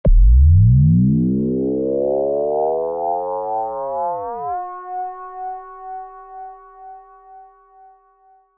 synth efx rise

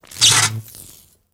Electric Arc Discharge, Scratchy Zip; arcs & sparks

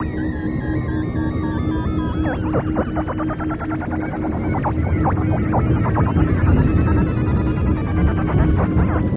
Atmos Synth Pink Noi

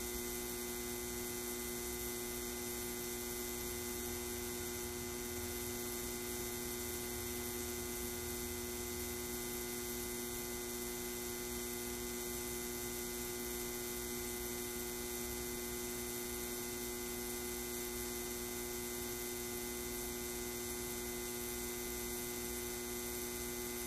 Neon Light Buzz

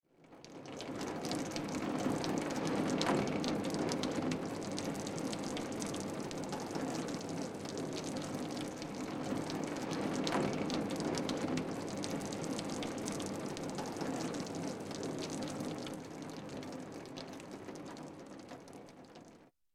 Rain Heavy Rain On Car

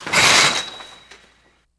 Shower Curtain Slid

